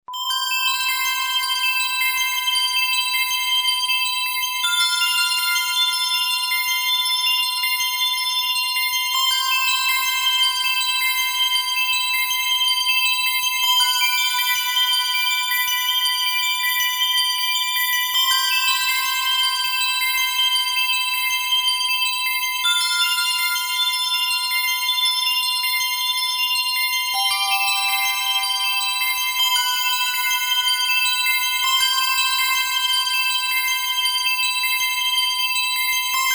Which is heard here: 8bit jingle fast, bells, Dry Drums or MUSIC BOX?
MUSIC BOX